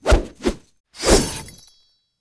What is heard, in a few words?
Crystal attack